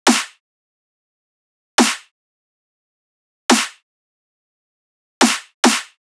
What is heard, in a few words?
Riddim Drum Loop Snare Clap (140); Ghosthack; Riddim Essentials 2020